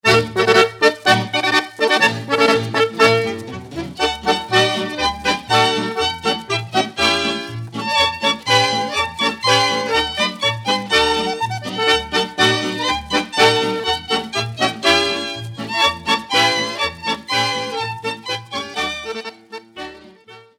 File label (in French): Polka - Allemagne